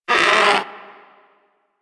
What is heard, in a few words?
Predator Vocal Pain, Hunting Critters; Warframe